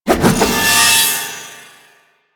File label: Staff Heavy Charge; Warframe; Duviri; Dax; weapons